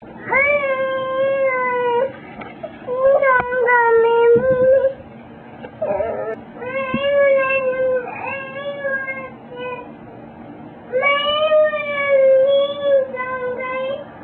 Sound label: whine